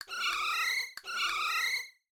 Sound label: alarm